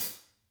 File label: hat, Vintage Timetraveler kits